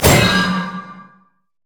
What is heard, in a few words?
orbs; Diana